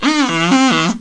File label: Trumpetr; Mäusejagd Interactive Demo